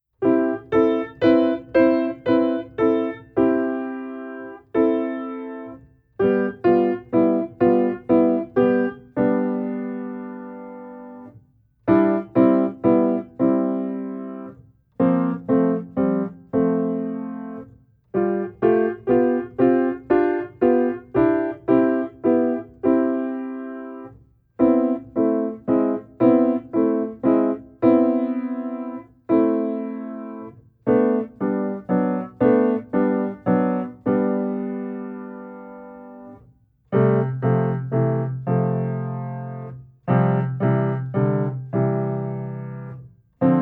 Akkorden uitbalanceren, Piano oefeningen

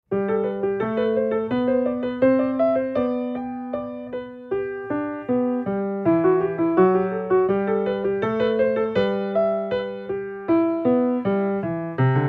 Onafhankelijke ritmen, toonladder

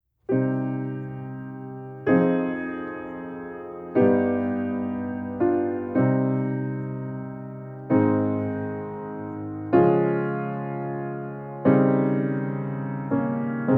Uitgebreide opeenvolging van akko, Piano oefeningen

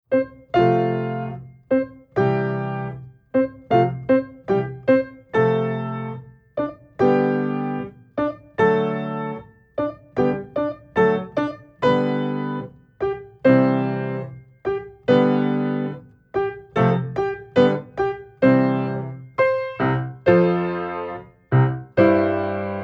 Oefening met langere sprongen, Piano oefeningen

